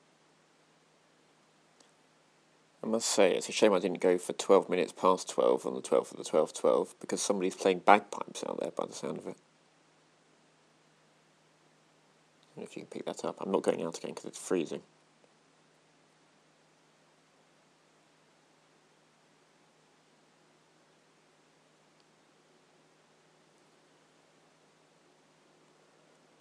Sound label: bagpipes